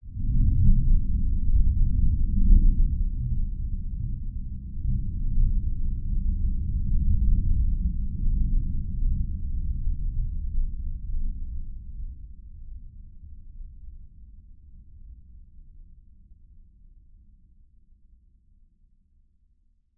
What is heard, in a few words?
nature thunder rumble just low frequencies